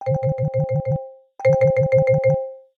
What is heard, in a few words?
Spokes, alarms